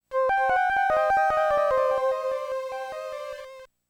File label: Whistle; Cadash; The 16bit Audiophile Project; Genesis